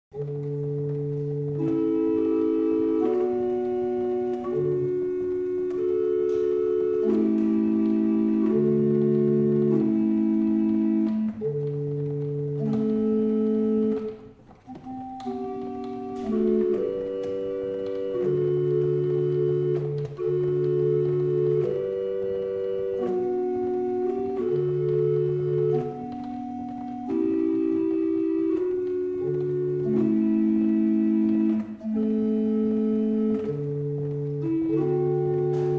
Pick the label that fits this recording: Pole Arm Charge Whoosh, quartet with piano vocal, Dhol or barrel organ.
barrel organ